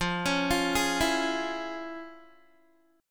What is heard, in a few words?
F Minor Major 7th Sharp 5th Guitar; FmM7#5; arpeggiated; 13,16,15,13,16,x